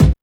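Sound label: BASS DRUM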